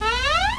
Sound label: open